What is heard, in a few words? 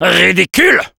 Spy negative vocalization, fr